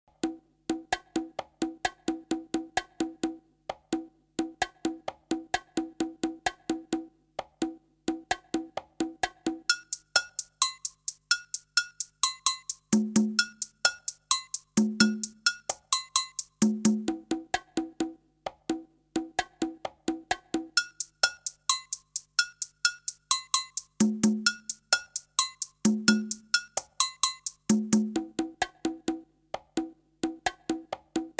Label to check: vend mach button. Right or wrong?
Wrong